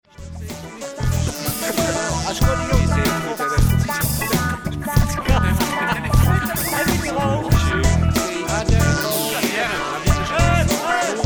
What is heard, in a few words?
funk